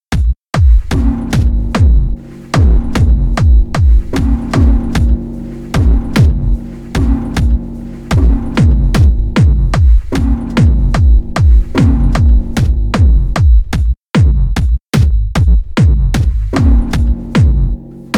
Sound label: drumsounds; noisebeats; icdiffusion; zahlenkomposition nr 3